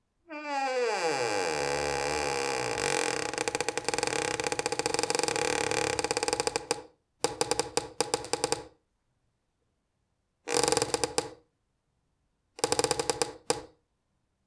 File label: Door Creaking